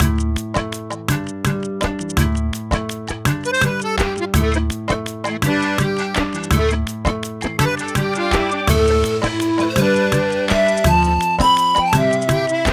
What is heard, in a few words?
Town Loop; Sparklite